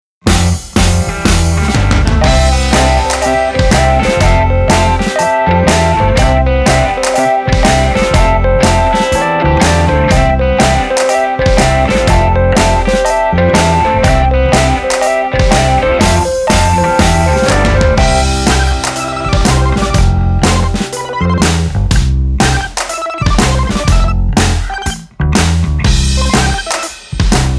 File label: INDIE POP, ringtones; i-mobile; i-style-711